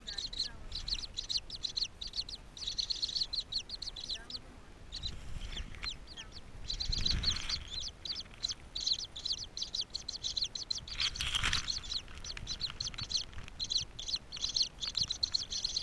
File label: babies Chirping, eagles